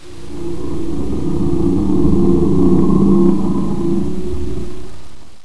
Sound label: long sigh; lmctf